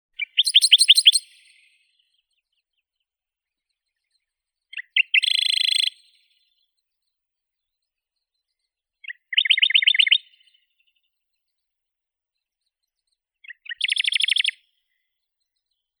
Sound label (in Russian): penochka tolstokluvaya phylloscopus schwarzi